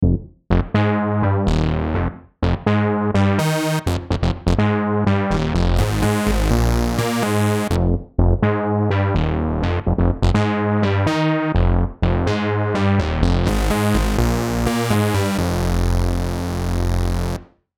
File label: fat unison saw; mario fest